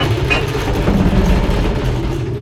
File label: mine cog; darwinia demo